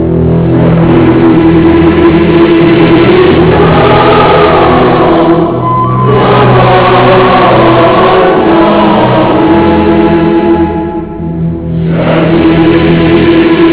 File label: chorus